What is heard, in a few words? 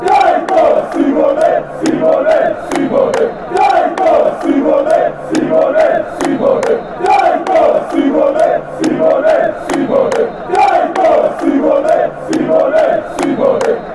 montenegro chant, fanchants